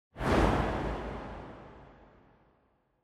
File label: Camera Motion Reverb; シーン・カメラモーション